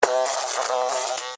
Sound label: Electrical Zap, Scifi, Electrical, Zap, Shock, Short, Science, Fiction